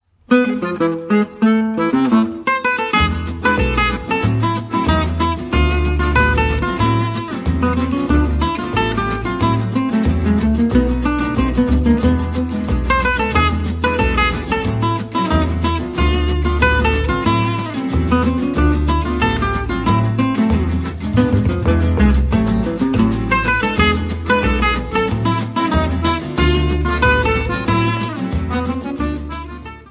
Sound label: Boss Bossa